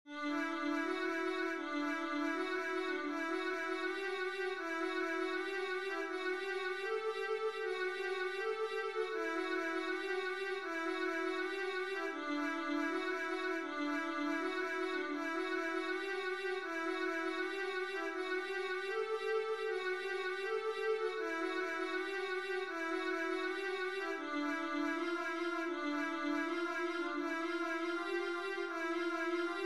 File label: vla etude, viola